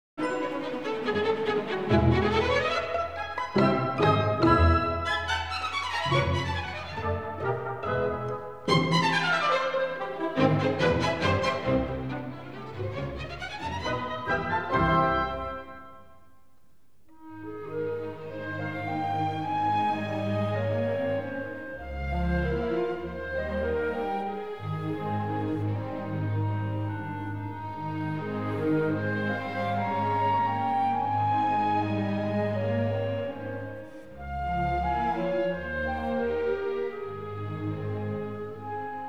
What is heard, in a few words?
Orchestra